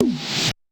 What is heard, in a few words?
Korg 01W MegaDrums; 300 Drum Machines